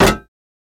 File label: Bullet Hit Car